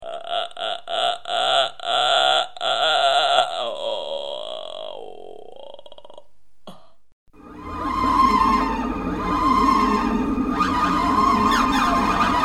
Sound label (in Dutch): Harpje; CVAurora